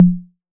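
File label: 808 low conga, Tape; Goldbaby Tape808 & Tape909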